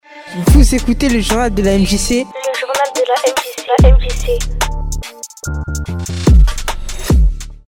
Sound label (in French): JINGLE JOURNAL; MJC